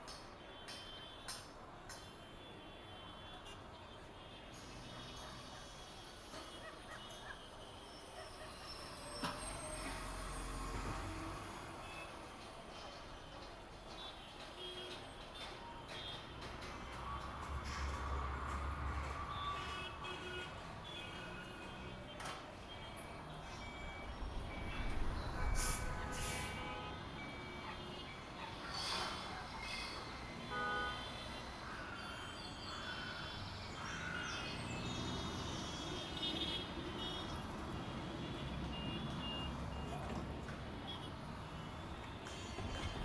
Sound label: town amb; Blind Drive